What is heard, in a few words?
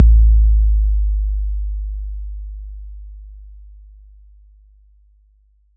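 bass drum 808 Decay F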